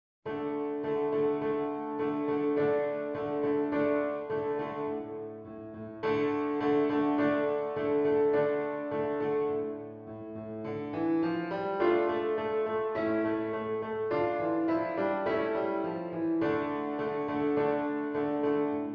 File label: PIANO ACCOMP, SATB Accompaniment Track; Sing We Now of Christmas